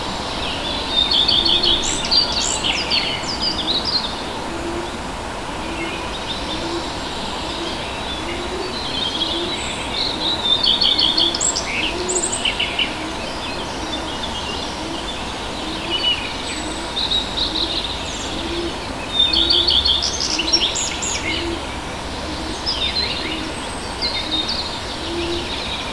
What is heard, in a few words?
early mountain birds habitat; soundscapes